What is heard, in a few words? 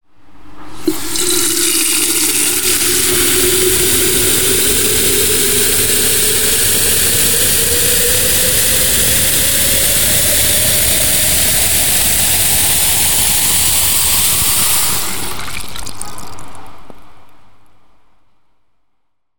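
sound of tap with filter attached to kettle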